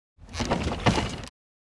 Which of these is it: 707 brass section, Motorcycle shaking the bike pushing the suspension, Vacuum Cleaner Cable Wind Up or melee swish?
Motorcycle shaking the bike pushing the suspension